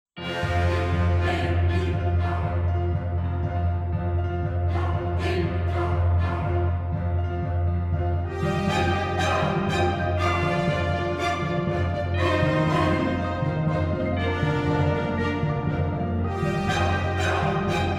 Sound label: Orchestra; TO Animated Orchestra; Animated Shattering Wavefronts